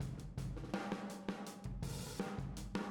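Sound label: Boogaloo, Room Mono, 82 BPM; Drums; Verse; Sonic Foundry; Drums from the Big Room The Mixes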